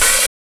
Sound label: OHH; E-Lab; X Static Goldmine 1